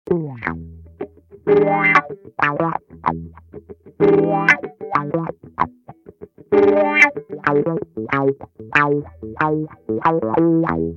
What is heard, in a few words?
WAH